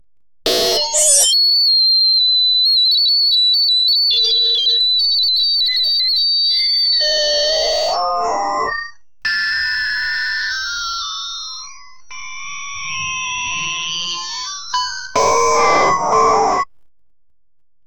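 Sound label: make the most extreme alarm, warning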